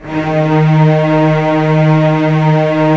STR VCSF, Roland LA Composer; Roland - LA Composer vol. 4

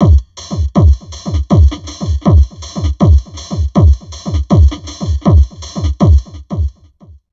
Drum Loops Crazy Processed

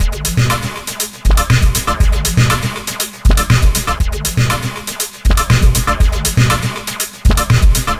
pippy beatstocking 120bpm, downbeat dance grooves

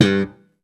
POP G3, Bass Electric

